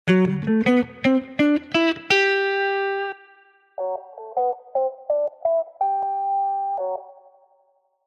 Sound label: Jazz Guitar; Ueberschall Jazz Guitar